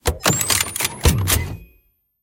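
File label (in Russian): трансформация межгалактического лазерного оружия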